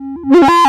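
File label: analog synthesizer korg monologue samples bass cutoff resonance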